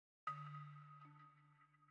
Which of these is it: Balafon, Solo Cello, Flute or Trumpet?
Balafon